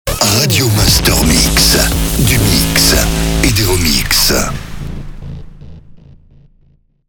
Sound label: Sweeper SLOW; Radio Master MIX; Habillage